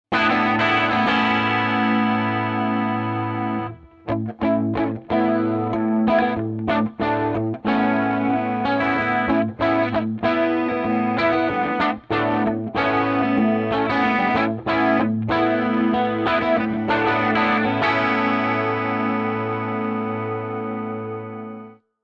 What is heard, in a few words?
Brass Chord; 20th rock Classic Chord in the series